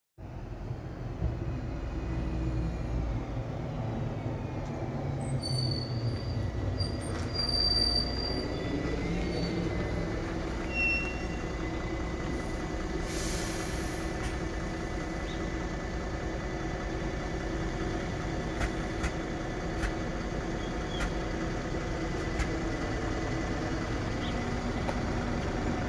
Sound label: Bus Stop Rural location